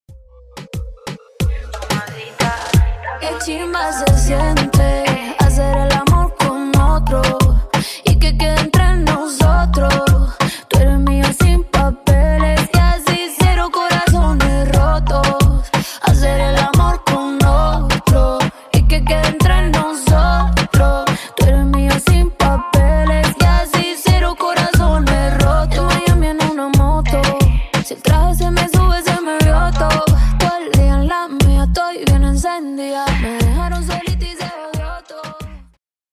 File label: Intro Outro 90 Bpm